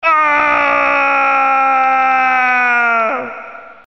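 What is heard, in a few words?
soldier scream falling; voice packs; soldier nukem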